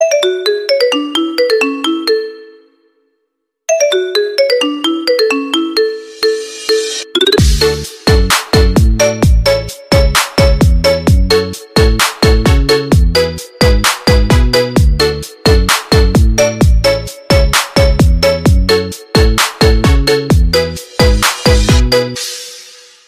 NOKIA DERING REMIX

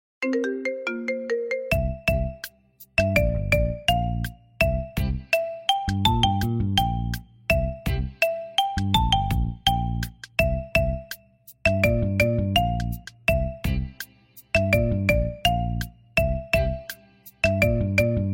Marimba Remix

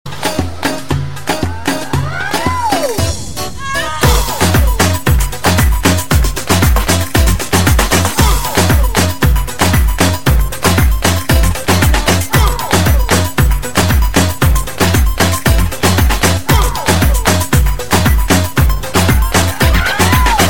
cat funny melody

